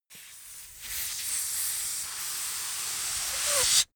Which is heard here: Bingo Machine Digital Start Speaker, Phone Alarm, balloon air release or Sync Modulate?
balloon air release